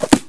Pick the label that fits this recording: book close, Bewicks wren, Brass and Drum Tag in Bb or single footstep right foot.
book close